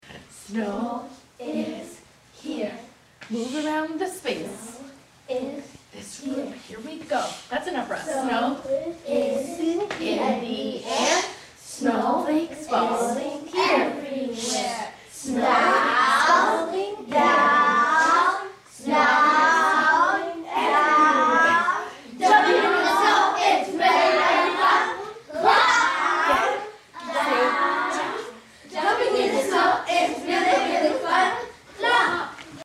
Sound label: Snow Chant in Canon